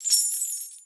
BELLS; Xsample VOL-9

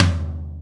TOM, Ensoniq ZR-76; 300 Drum Machines

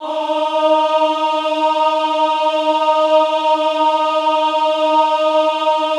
AHH D3, choir; Best Service; Extended Classical Choir; AHH MIX

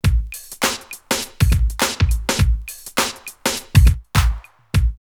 Bronxy Beat 102, drumloops OldSchool; X-Static Goldmine

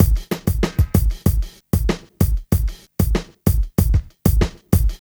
Street Tip, R&B Drums

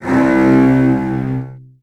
C1, Virtuoso Strings, 16TH; ILIO; Kirk Hunter; CL 16TH

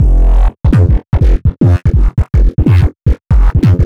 Neuro Bass Loop Quick

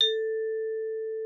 XYLS A4, VIBES; Xsample VOL-4

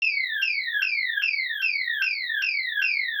synth loop hype layer 150; Virtual Riot

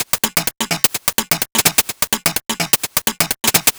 Hihat 127bpm, House Drumloops

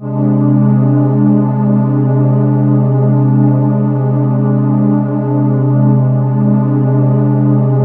beauty, ethereal atmosphere

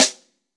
SN, Snare; AKAI S6000 CD-ROM - Volume 4

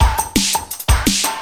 loop, 170 BPM, drum & bass

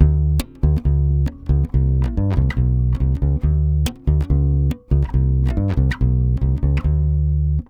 BASS GROOVE, Brazil Bossa; AKAI; USB Soundscan vol.16

